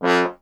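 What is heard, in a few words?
bass trombone F; Miroslav Vitous; Solo Instruments VOL-1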